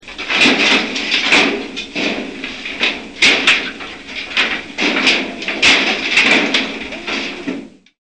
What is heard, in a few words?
SHT METAL